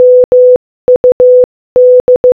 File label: MUD Morse Code Audio